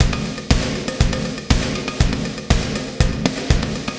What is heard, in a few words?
120 POWER, drums